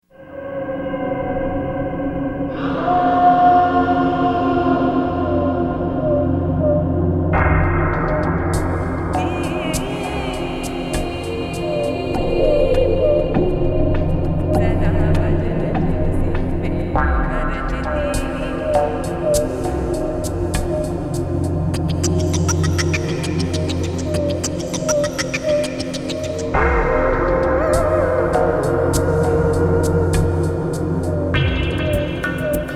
ambient world

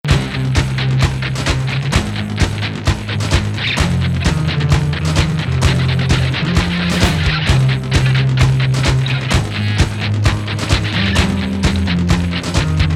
rock guitar

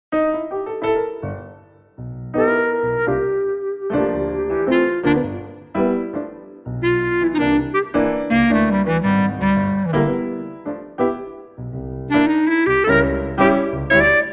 Blues

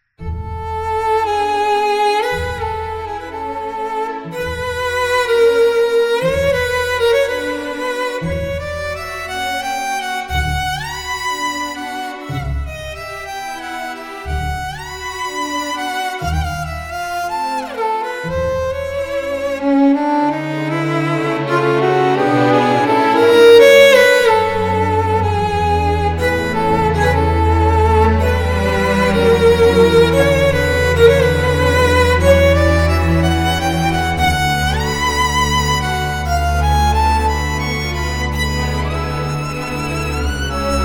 Violin Test Strad; Garritan

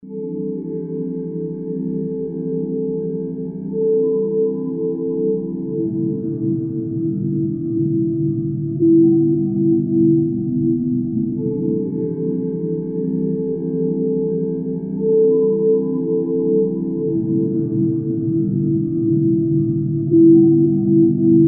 Ambience

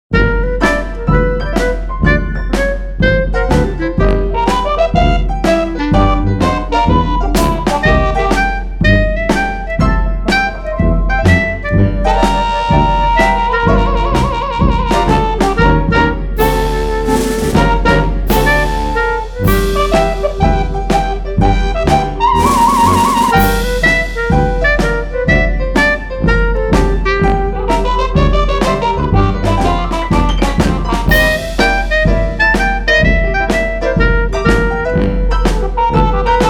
Blues on Bourbon Street

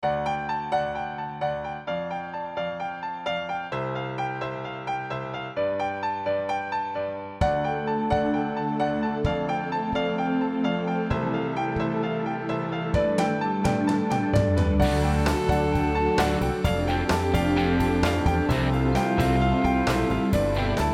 pop track

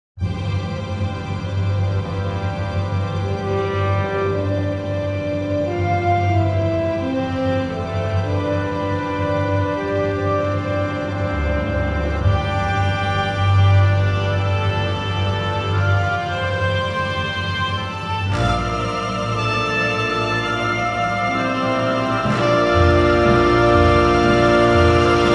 Orchestra small to big

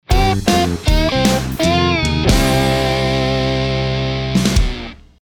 Electric Swamp Sting

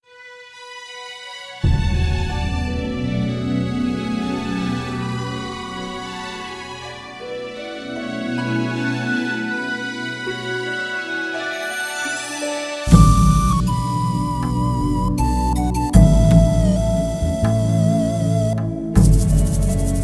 quiet to triumph

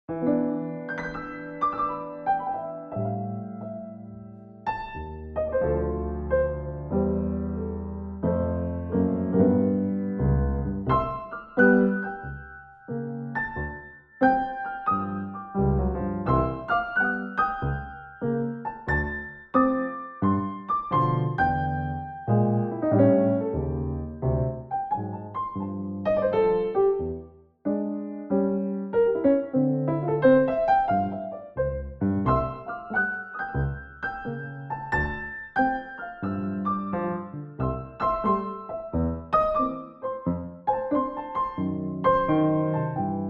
Strolling Blues

